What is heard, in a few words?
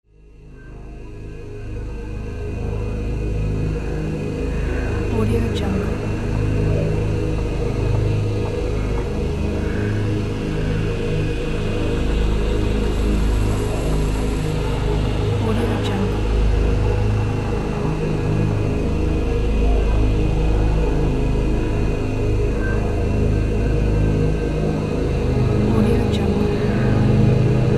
invisible creatures soundscape